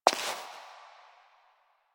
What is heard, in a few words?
Step Rock; Warframe; Koumei